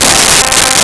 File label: Trombone C4; Nintendo; July 2020 Gigaleak